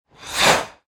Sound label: Sports Ice Skates Scrape Stop; Ice Skating